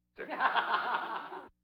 Laugh tracks; Valentino Production Sound Effects Library CD50

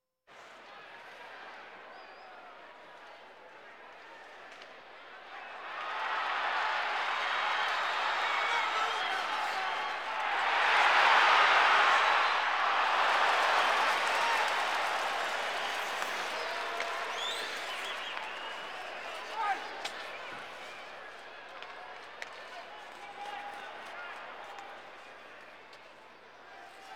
Sports crowds

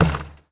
Street Ball Glass Basket; SFX Kit; Street Basketball

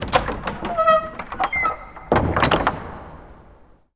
dungeon entrance open; DeadFright

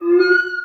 Megaphone Feedback; Home & Office; 1001 Sound Effects

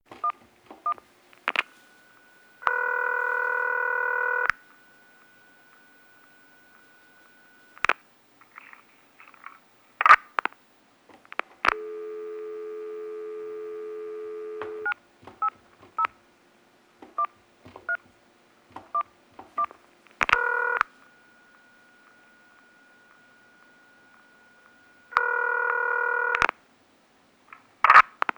Phone Speaker Dial Ring; Home & Office; 1001 Sound Effects

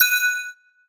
Pickup Gem Bells; Pickups

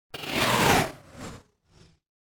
Whoosh Sharp Wave; Hollywood Edge; sound designer's toolkit v2

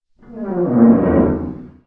CREAK Metal Heavy; mono; Universal Sound FX

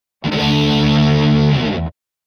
Guitar Heavy Metal Finale Chord; Hollywood Edge; sound designer's toolkit v2

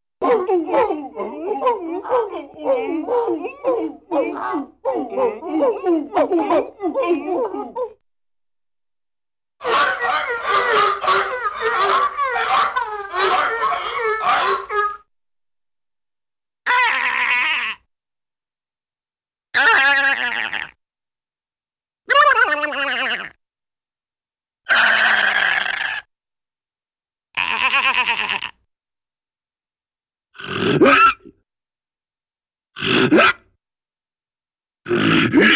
Group Of Seals Bark Growls, Cartoon; Hollywood Edge; Cartoon Trax

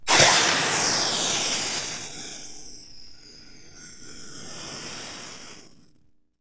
Steam Sizzle Hit

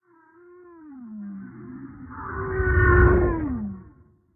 sea animals; Blind Drive

